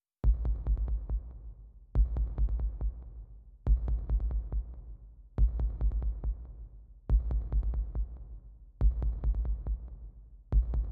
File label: Drums